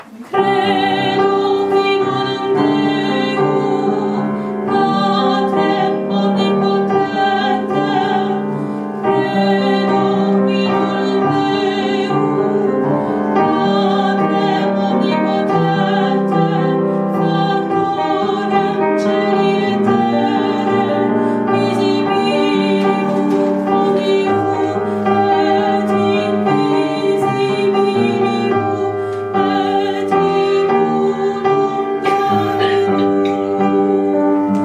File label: credo tutti